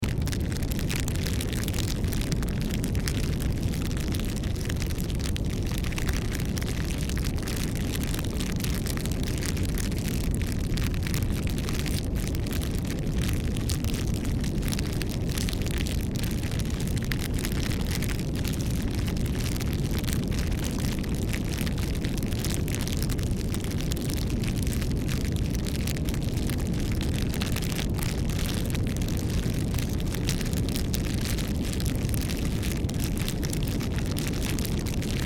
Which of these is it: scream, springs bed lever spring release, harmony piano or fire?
fire